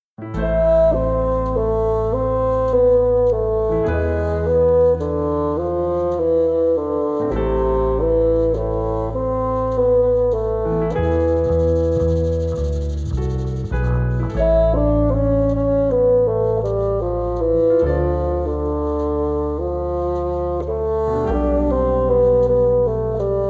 Baroque Adaptations for Bassoon and Jazz Trio, Largo